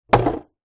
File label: foley wood large board drop ground